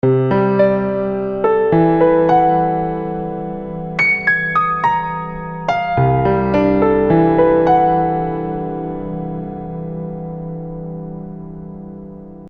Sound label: Fifths Tone Row over time